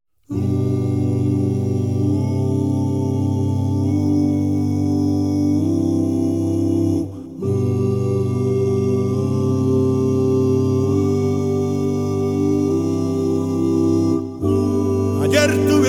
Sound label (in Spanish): voces